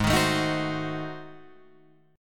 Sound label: G sharp 7th Flat 9th G sharp down Guitar Drop D, chord